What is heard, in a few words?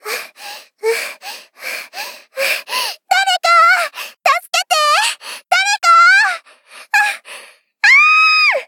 Scream Help me